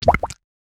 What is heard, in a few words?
two colorful slime blobs, collide